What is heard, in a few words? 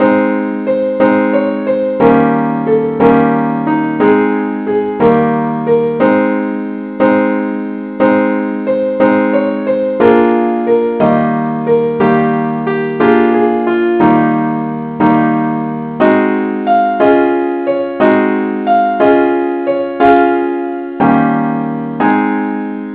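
simple accompaniment, key a flat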